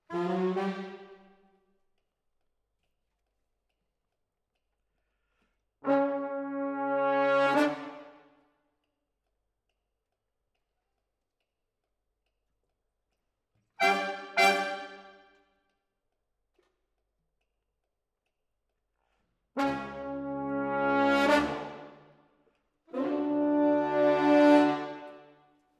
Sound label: Horns Over